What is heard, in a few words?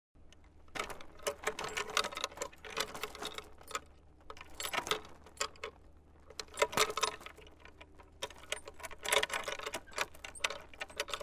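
moving swings on chains; Звук качелей раскачивающихся на ветру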